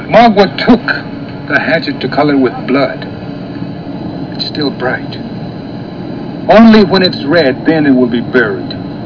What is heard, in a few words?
hatchet